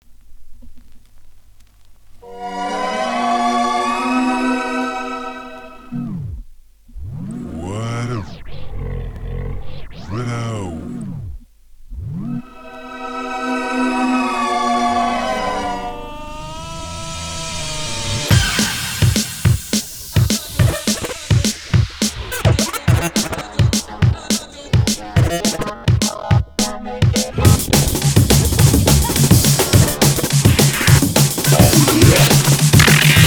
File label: Mini Bassdrum (Small)